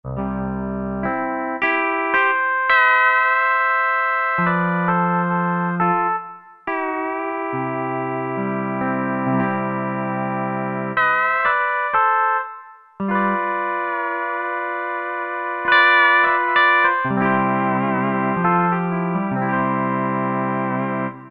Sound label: Pedal Steel, Roland SR-JV80-07 Super Sound Set